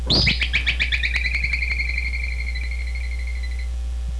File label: woodchuck